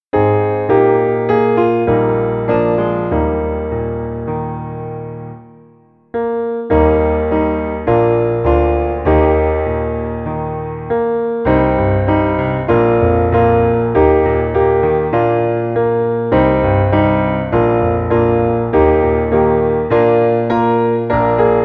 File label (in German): inst; Weihnachtslieder; lieb nachtigall wach auf